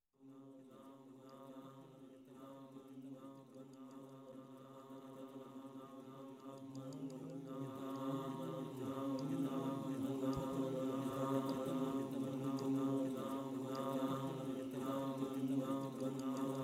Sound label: Nga Manu chant